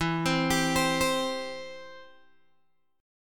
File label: E Minor Sharp 5th E down Guitar DADGAD; Em#5; arpeggiated